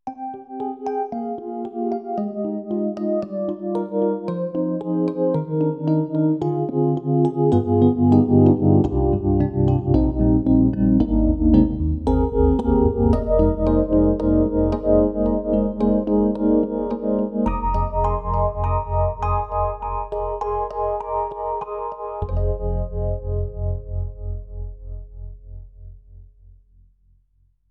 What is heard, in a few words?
EDIROL SD-90, Solo, Tremo Rhodes; Sweet Tynes